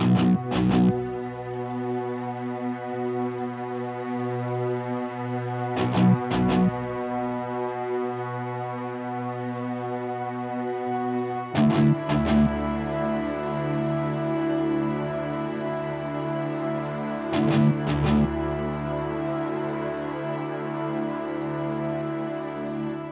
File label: Drone; Eldrador Creatures Shadowfall; Xbox Series X/S